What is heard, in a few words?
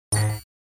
Hi tech confirm button interface